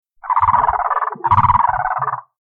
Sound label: anthropoides paradiseus; gruidae; calls